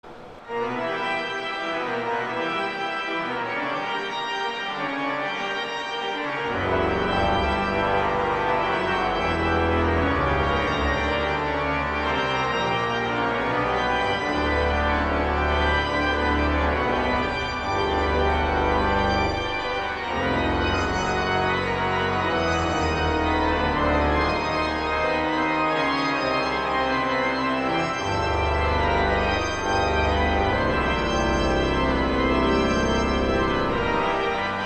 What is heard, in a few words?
Organ; Marcel Dupre; Gloria; St. John's Cathedral, Jacksonville Florida